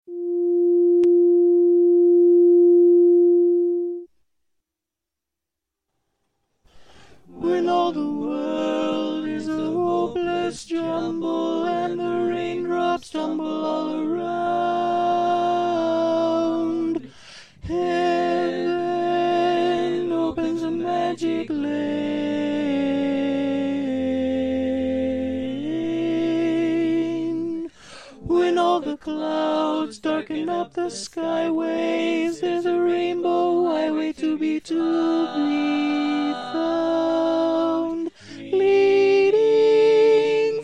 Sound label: Rainbow in F Tenor